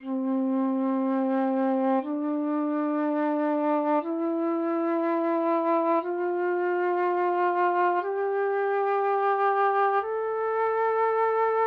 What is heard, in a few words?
Test Flute Tone